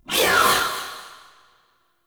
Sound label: Unyielding Gallop Cast Vocal; Warframe; Dagath